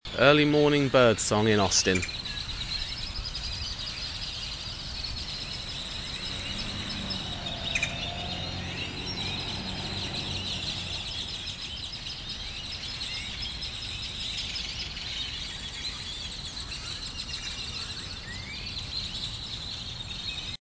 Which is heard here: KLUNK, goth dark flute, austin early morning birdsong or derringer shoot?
austin early morning birdsong